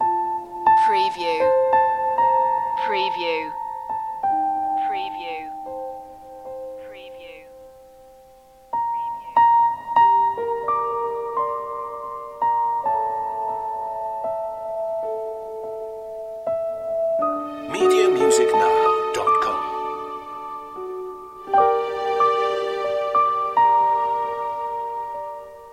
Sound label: waltz loop